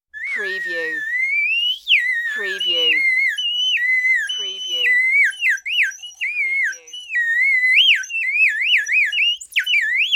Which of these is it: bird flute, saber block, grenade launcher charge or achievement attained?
bird flute